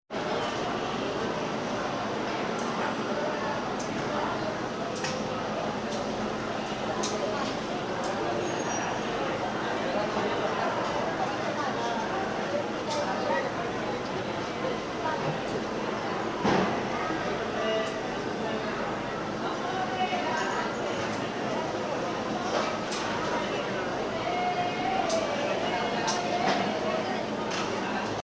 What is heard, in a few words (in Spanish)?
Ambiente de la pescadería de un mercado